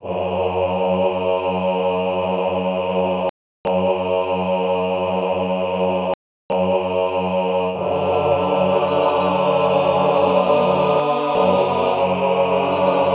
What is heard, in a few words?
dropout